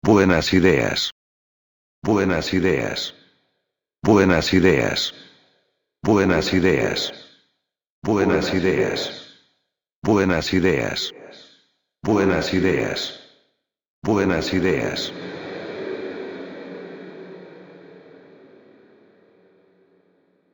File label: Galactic Reverb Vox; CHERRY AUDIO GALACTIC REVERB